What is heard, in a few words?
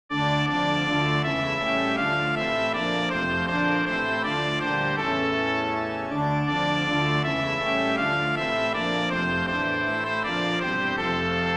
GOD REST YOU MERRY Trumpet Descant